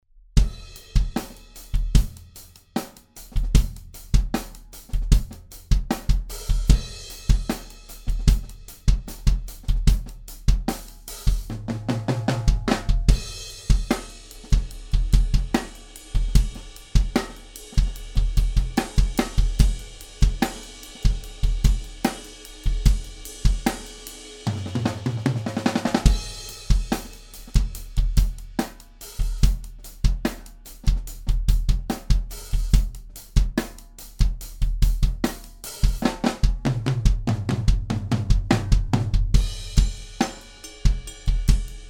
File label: Clarett 4Pre on Air Drums